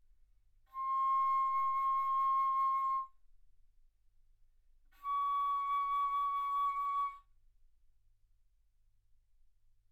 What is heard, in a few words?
Bass Flute ff C6 Db6